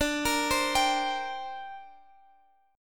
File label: D 7th Suspended 4th Sharp 5th D Guitar Standard; arpeggiated; capo 3; x,x,12,15,13,15